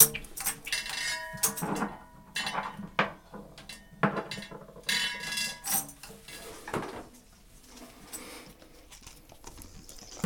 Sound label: clang